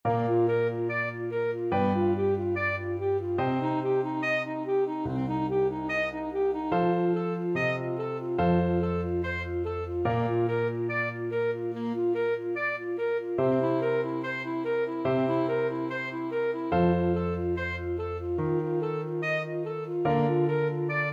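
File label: prelude d, alto saxophone; carcassi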